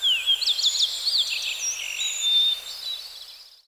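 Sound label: distant birds, forest; ambient; Half Life 2; Episode 2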